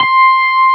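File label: Wurlitzer C5 - R; USB Soundscan vol.09; AKAI; Keyboards Old School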